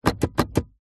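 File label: vehicles car gear stick automatic wiggle wobble interior toyota rav4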